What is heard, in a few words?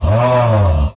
ahh